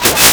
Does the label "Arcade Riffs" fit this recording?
Yes